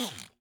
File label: Aircrafts Bf-109K-4 Governor End; DCS